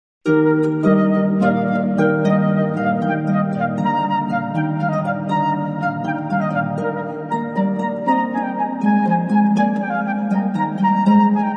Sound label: Flute Harp